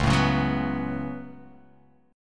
C sharp 7th Suspended 4th Sharp 5th C sharp

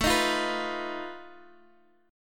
B 7th Flat 5th A, guitar chord

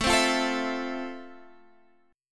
F Major 7th A

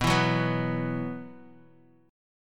B Suspended 4th B, chord; Bsus4 strummed; JGuitar

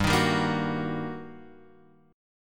G Minor Major 7th Sharp 5th, down, Guitar, Open F; GmM7#5 strummed; JGuitar

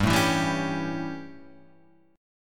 A 7th G, chord

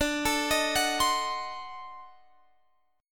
D 7th Flat 9th D, chord

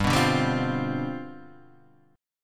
C Major 7th Suspended 2nd Suspended 4th, G, chord

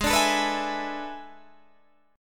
A Major 7th Suspended 2nd Suspended 4th Ab

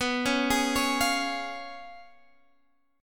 Db Major B; Db/B; arpeggiated

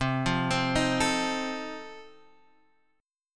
F 7th C

